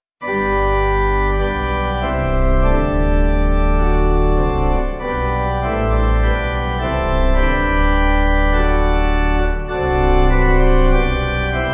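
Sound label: Pipe Organ, Music Backing Files for Churches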